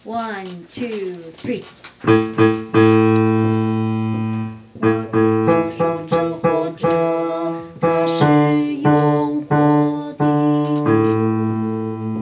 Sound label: Bass